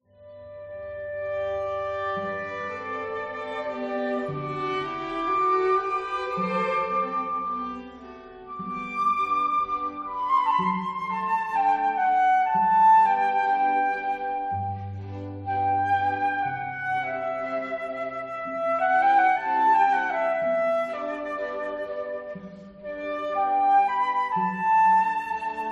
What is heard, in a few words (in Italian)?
Quartetto per Flauto e Archi; G. Paisiello